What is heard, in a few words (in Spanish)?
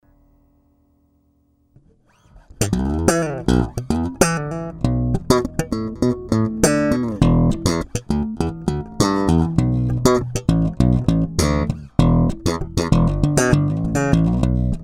bajo slap